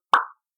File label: Pop Sound